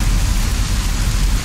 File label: fire loop, flamethrower; halo; weapons